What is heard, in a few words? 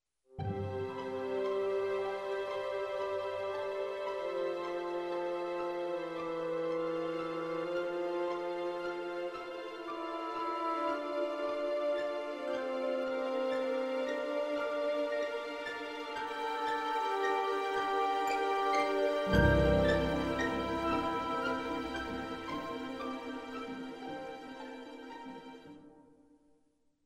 melody ww summary bassoons